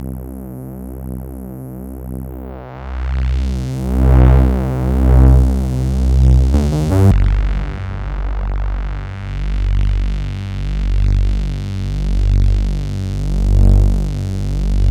Syrinx Live Filter

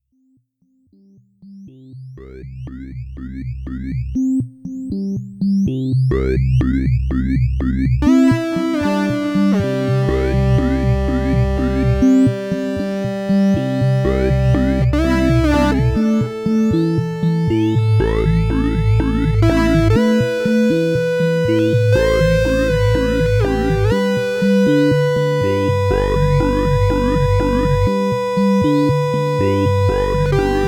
Xenophone Hyper Synth Lead

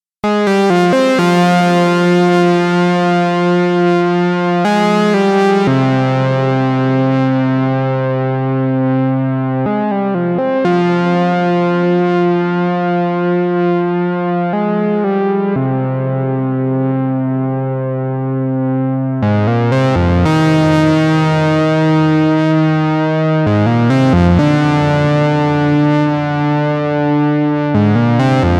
Vermona Mono Lancet Sawtooth

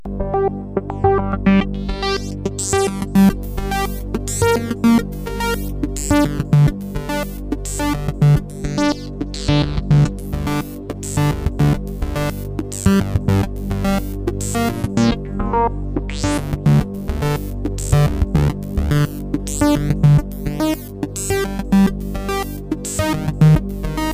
ms20 filter sweeps

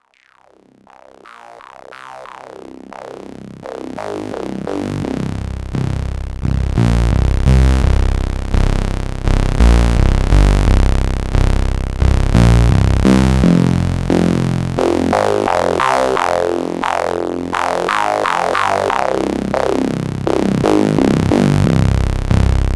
MiniBrute Bass HPF

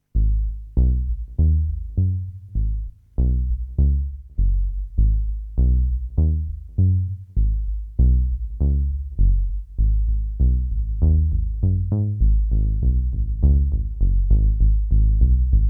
Bass; PERfourMER

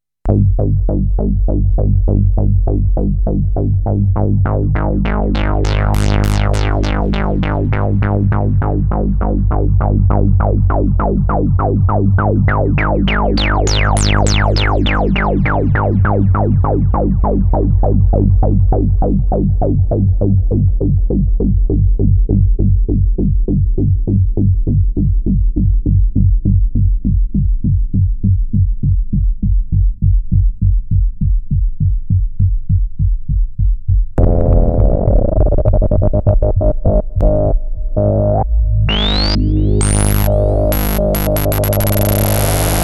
Dominion X LFO VCF Mod

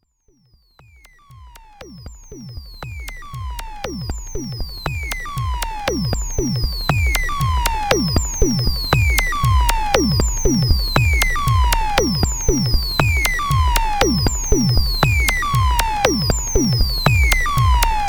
Waldorf Rocket Mono FX

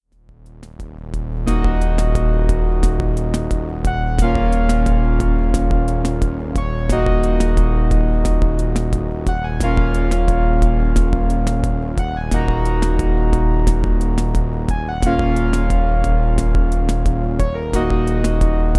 Arturia MiniBrute 2 Short Melody

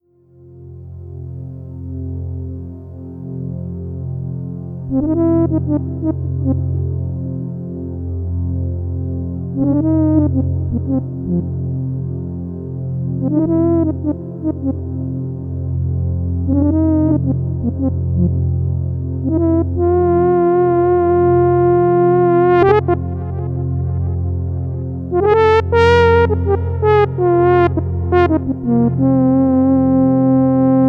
Juno-60 JD-800

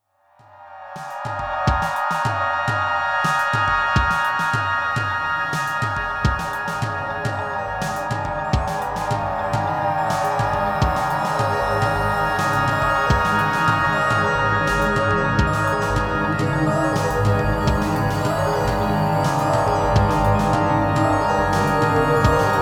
Summit Mash Up

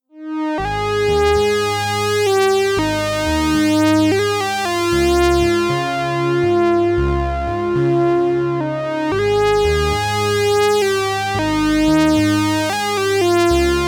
Arturia MiniBrute2 Saw Solo

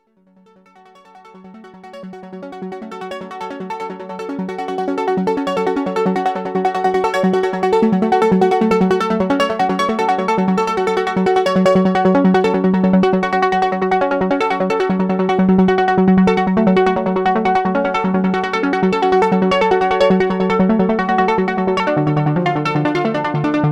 Arpeggio, synthesizer; GRP A4

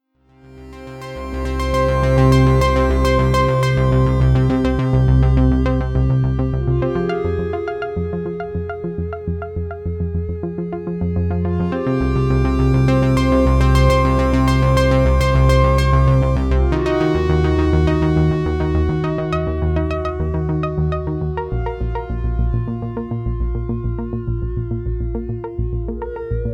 Roland Jupiter8 Simple Split Arpeggio Lead

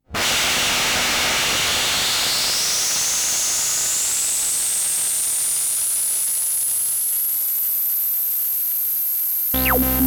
MicroBrute Filter Mod

